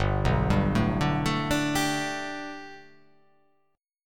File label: G Major Add 9th chord